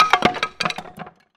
collapse

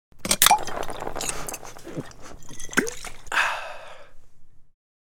Use

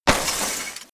Teleport

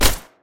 Crossvec Suppressor

